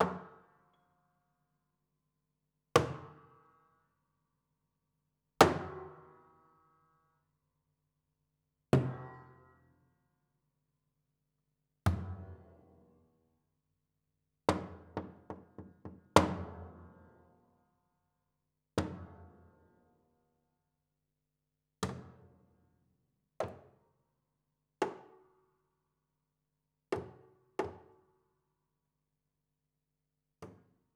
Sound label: Body Thump, Piano Destruction